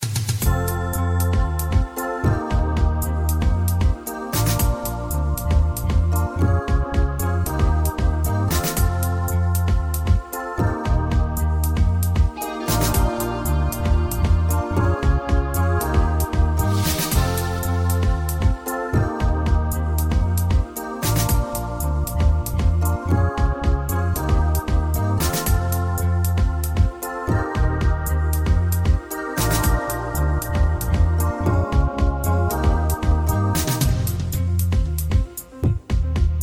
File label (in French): Tapis sonore